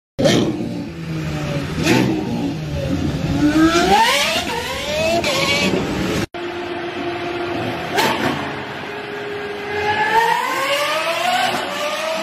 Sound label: Car racing